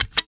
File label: Guitar FX; Roland BK-5